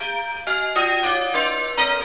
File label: bells